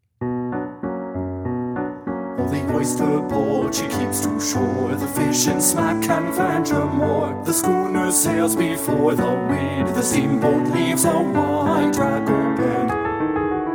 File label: Stomp